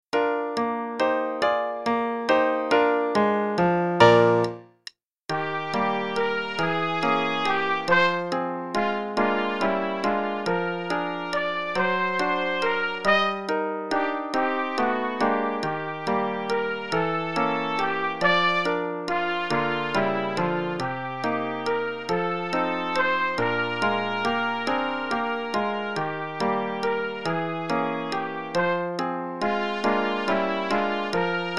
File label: Play-a-long, both parts and click, qn=140, trumpet; Artemis; in Eb